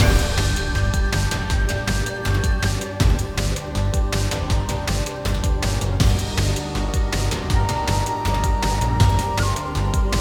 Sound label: Boss Music; Robbie Swifthand and the Orb of Mysteries; PS4